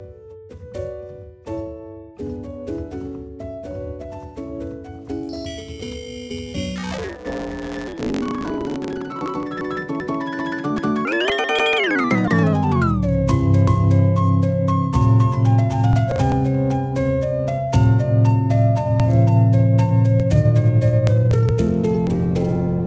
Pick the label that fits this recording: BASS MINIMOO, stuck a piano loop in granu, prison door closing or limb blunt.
stuck a piano loop in granu